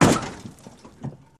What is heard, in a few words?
Auto Crash Sml Head, car crashes